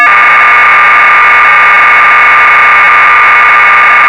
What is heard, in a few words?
output, bfsk modem